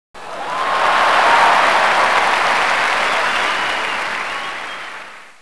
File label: crowd